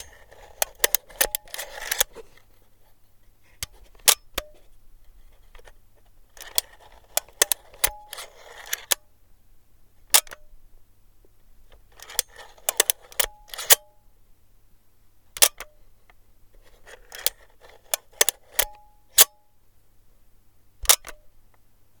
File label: camera film rewind lever and shutter slow, Old Cameras; mono 192kHz; Soundholder